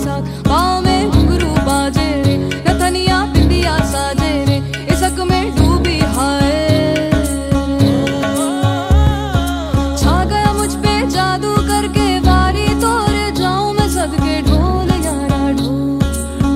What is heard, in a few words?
dhol ringtone